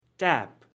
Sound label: tap; grade1; water